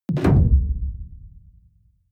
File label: sci-fi underwater impact; hits impacts